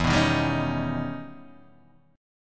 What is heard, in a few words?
E Minor Major 13th E Guitar Open D